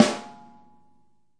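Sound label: snare, Multisampled Real Kit; REAL LIVE KITS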